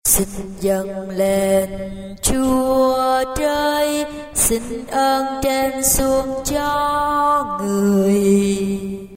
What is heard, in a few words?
basso